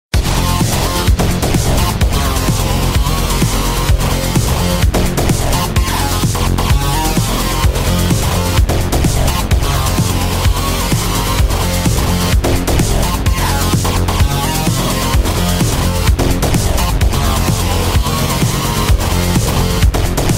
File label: tech